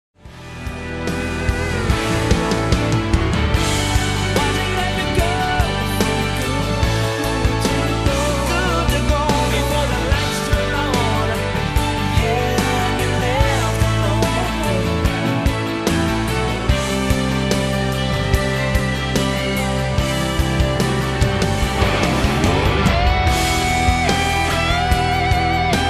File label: karaoke